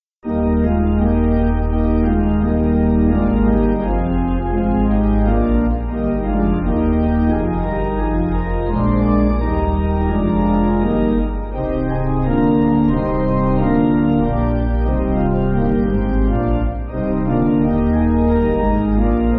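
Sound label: Pipe Organ, church backing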